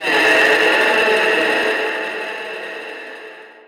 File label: scratch; Ballist